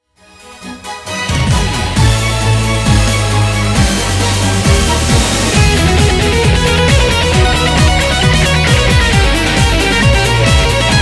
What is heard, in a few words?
crystal energy guitar solo